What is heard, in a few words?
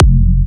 Jump Kicks, Bassdrums